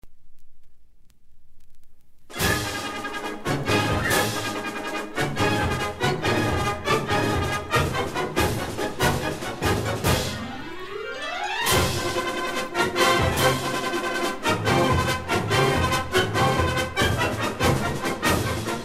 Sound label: Fanfare and March Intro; TMEA 1968